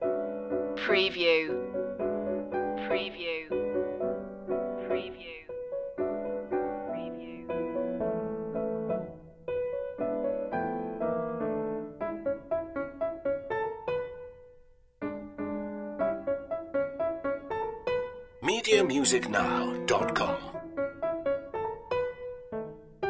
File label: swing loop